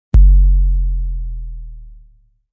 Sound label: Bass Dive